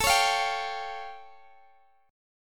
A Augmented 7th Guitar Open G; chord

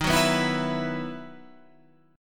E Major 7th Suspended 2nd Eb

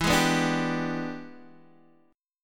E 7th Sharp 9th Guitar Open D, chord

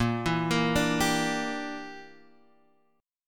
A sharp 6th Flat 5th, Guitar Half Step Down

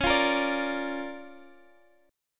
Bb Major Db; Bb/Db strummed; JGuitar

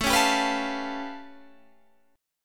A Augmented 9th A, Guitar Standard; strummed; JGuitar

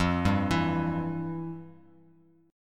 F Major 7th Suspended 2nd F, Bass Standard; arpeggiated; JGuitar

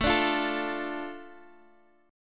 A Suspended 4th Sharp 5th

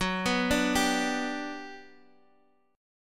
G Major F sharp, chord

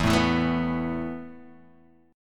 E Suspended 4th E, down, Guitar, Open D

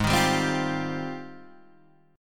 G sharp Major 13th, Guitar, DADGAD

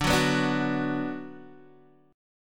D 6th D down Guitar Open D, chord

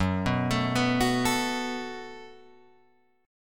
Gb Minor Double Flat 5th, Guitar Open G

